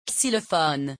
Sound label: xylophone